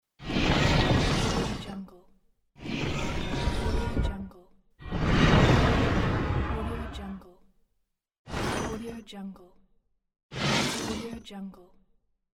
dungeon metal door open

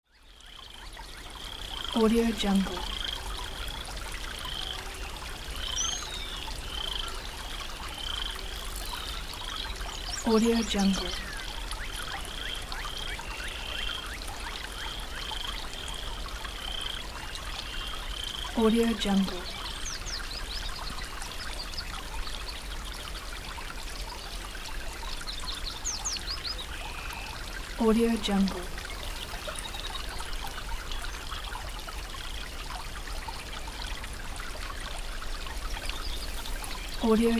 pond ambience

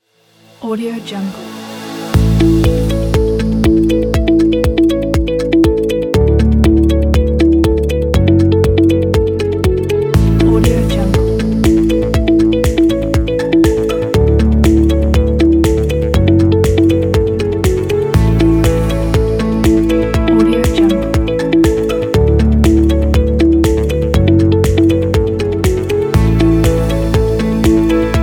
successful inspiring

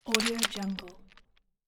pebbles drop

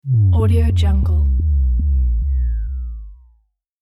pulse downer

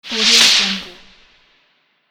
whip punch

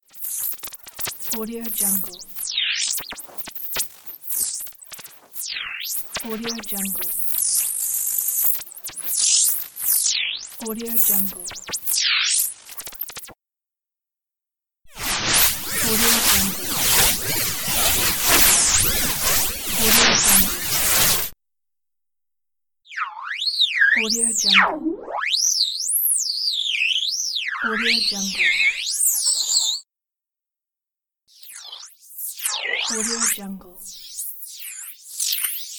frequency interference

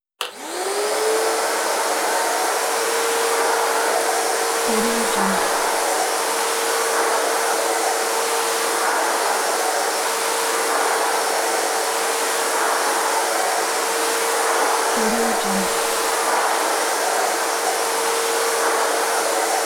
vacuum cleaner hoover

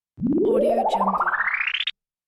ascending zip gliss; sound-ideas

